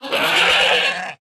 Ram Attack

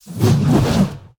Mace Large Attack Underlay

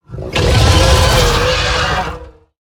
Scion of Ruin, Death

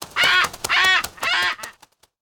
Albatross Attack

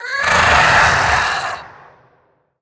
Imprisoner Death

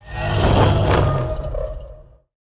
Death Sound

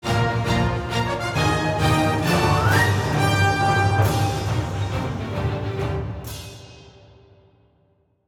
Pirate Play Stinger

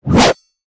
Black Queen Attack Underlay

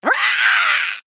Female Parrot Death

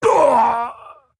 Male Orc Death; Hurkan

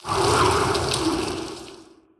Vermin Sensei Attack

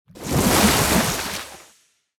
Aquatic Dark Attack Underlay

